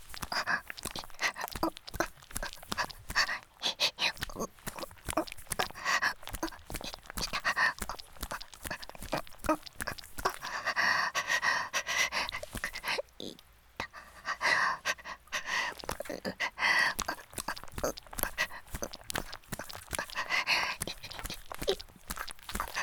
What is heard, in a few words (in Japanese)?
雨降り バージョン ギシアン ループ; 田舎のダウナー幼馴染の子作りを手伝って…